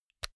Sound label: pull out mini jack phone; objekte; elektronik